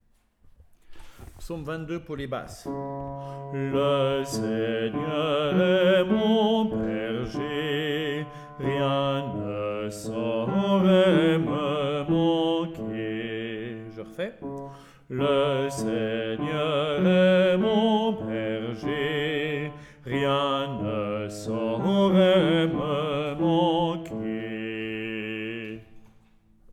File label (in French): Chants Psaume 22